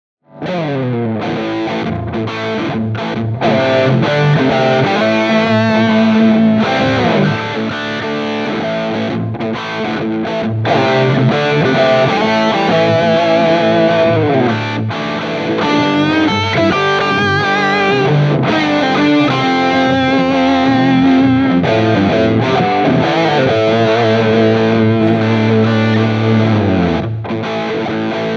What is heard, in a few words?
les paul hi gain, Tube Amps